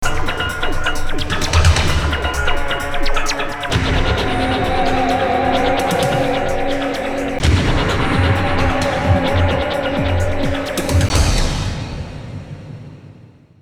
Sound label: Combo Jungle Loop; Total Overdose